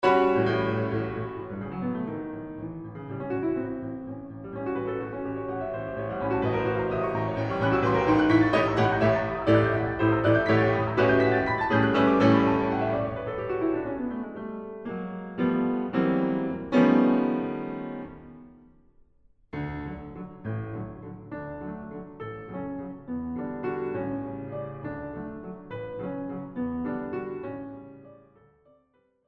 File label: Waltz, Piano Duo